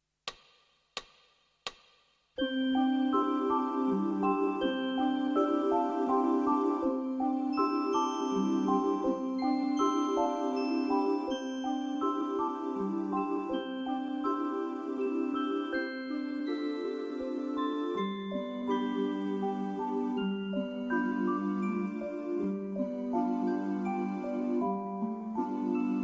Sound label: Lullaby (Wiegenlied); Brahms